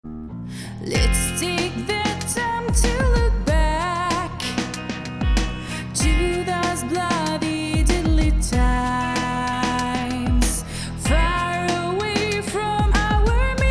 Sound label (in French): comp G full mix sans; overloud